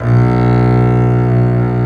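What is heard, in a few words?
Bass legato B0; Digital Sound Factory Virtuoso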